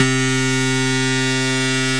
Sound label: Synthesizer Middle C Notes, Lead